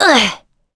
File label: Vox Attack; Hilda; kingsraid; voices heroes